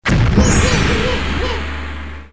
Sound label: fangpao